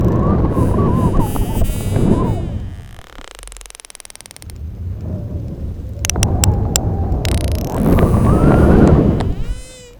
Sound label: pilot whale